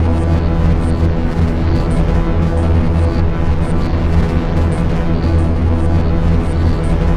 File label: LOCUSTS, Pads; Distored Reality 1&2